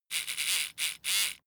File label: servo sequence